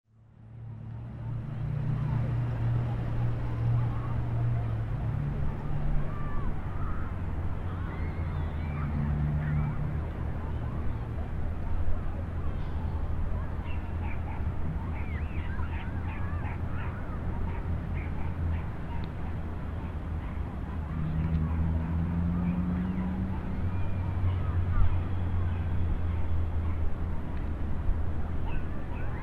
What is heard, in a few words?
distant fun sounds from the seaside